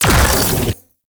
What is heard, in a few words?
Flame Laser Fire; Warframe; Corpus; Corpus Railjack; Spec Ops Fire Moa